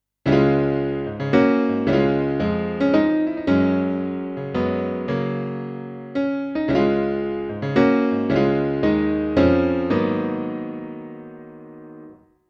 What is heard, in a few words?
Thomann AK X1100 Real Grand Piano